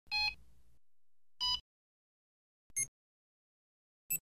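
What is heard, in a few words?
microwave sounds; zvuki mikrovolnovki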